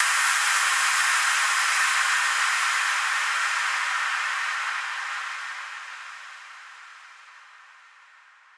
Exhaust

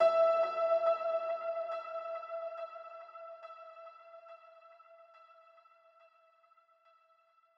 piano

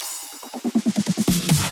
Percussion Fill

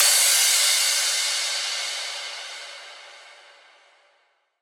Crash

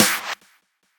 Clap

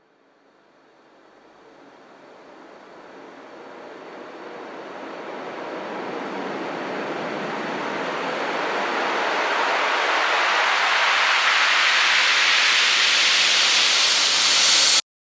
Uplifter

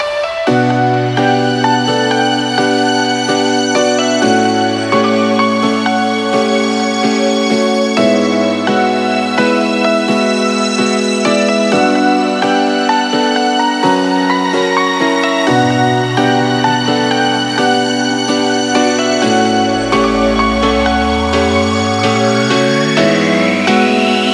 instrumental; 東方紅楼夢19

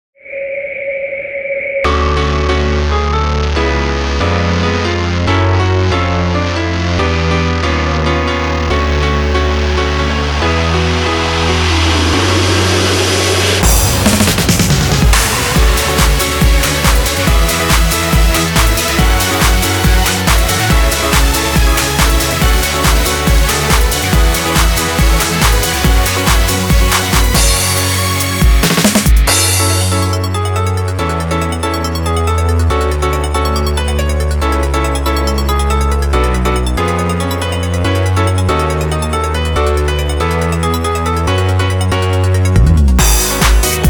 Distorted Overdrive instrumental; C98

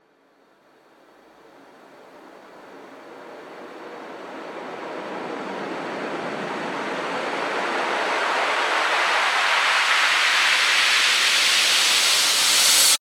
Uplifter

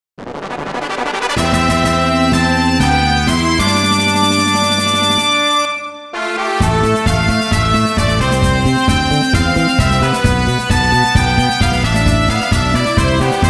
Fanfare

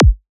Kick

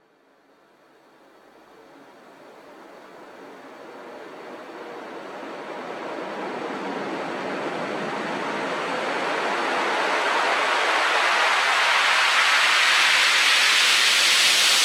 Uplifter

